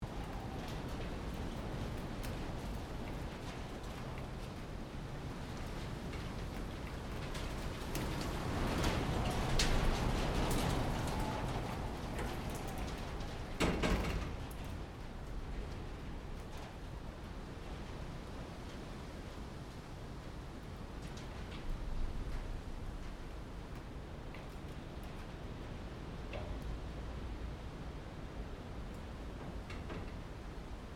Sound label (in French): vent serre